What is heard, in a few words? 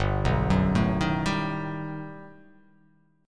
G Augmented Major 9th